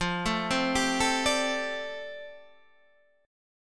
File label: F 6th Add 9th F down Guitar Standard, chord; F6add9 arpeggiated